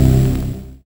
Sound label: YM Bass C; ChipShop Deluxe; ChipShop Hits